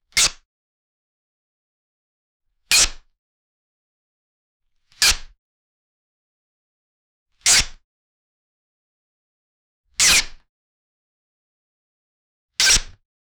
wall cling gripping, climbing

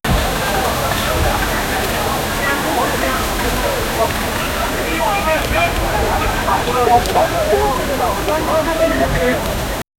fish market noise

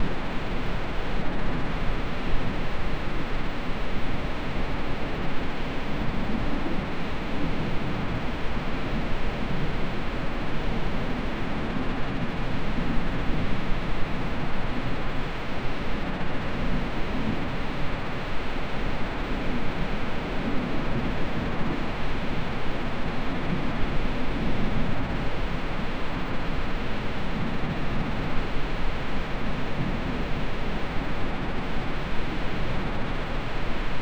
knights castle ambient; architecture

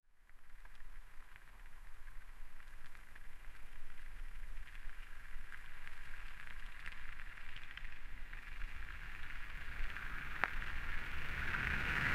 a touring bicycle travel

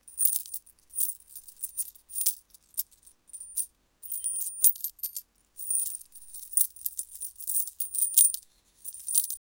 rustle of clothes with a, wooden creak

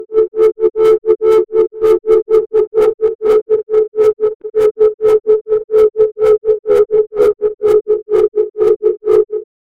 wobble

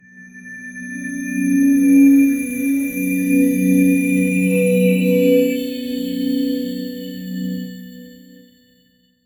mood magical connection

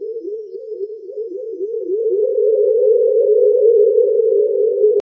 hypnotic spiral with binu, meditative

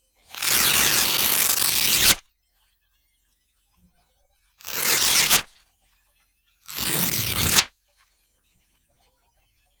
soft paper tear shimmer; short decay

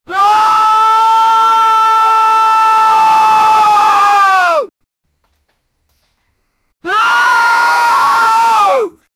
panic

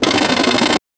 big win slot fanfare and

